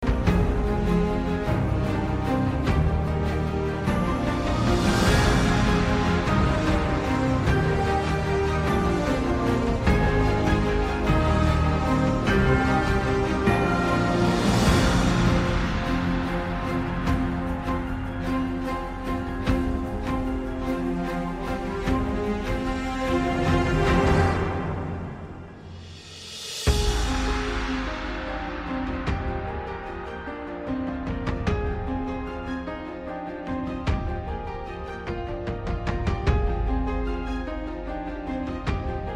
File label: cinematic, cinematic orchestra with epic drums